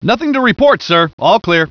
landing area peace; gearbox; gunman

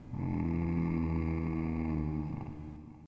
zomambient

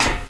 ladder, player; firearms

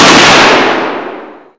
bren shoot, weapons; dod

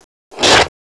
claw attack; rheptor

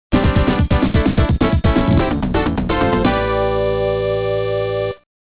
act cleared; Sonic the hedgehog

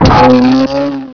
field impact; gearbox; flier

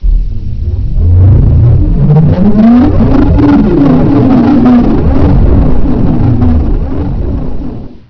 dive boom; tfc

